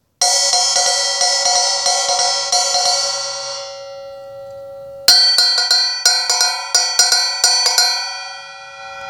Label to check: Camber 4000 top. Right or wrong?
Right